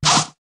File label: card draw